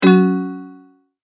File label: multimedia tone exclamation; McKinney Sound